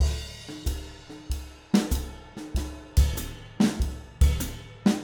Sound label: 095 Tradition Jazz Drums; Pro Tools Essential Loops; Digidesign